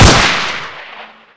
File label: new mp5; garrysmod